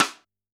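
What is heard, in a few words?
Snare Stick; drum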